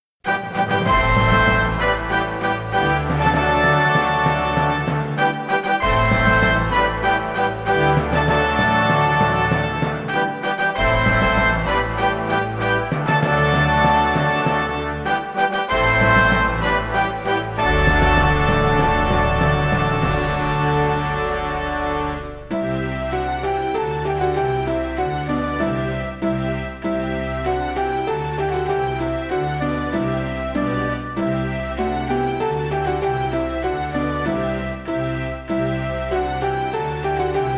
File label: Fanfares